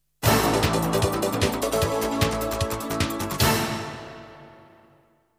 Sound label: jingles; 90 DDD Jingles